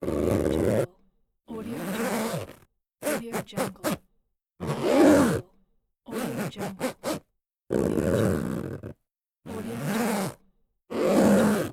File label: zipper bag